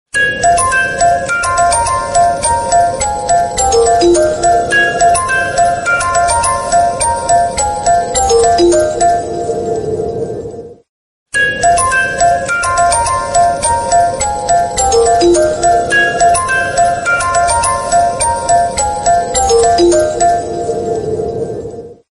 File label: Instrumental Ringtone